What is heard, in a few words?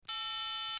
Telephone Cell Phone